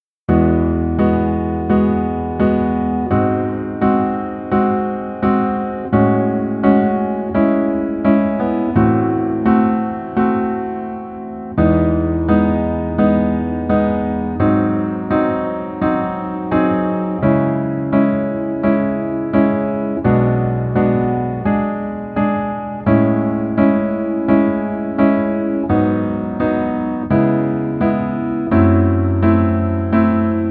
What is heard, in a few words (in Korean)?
Piano ver -1 키